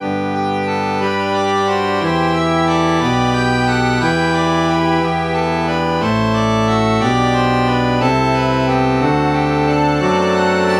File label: reed organ